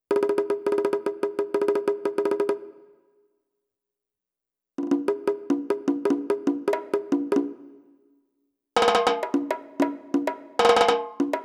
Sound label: perc 100